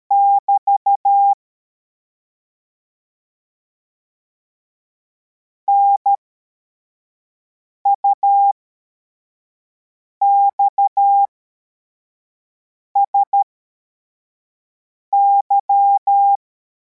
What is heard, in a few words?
cw, morse